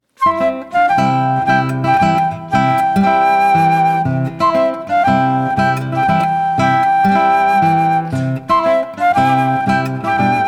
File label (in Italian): Marcia; Le nozze di Figaro di Mozart eseguita dal duo Fiato alle Corde